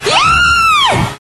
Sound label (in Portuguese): só grito; Queima quengaral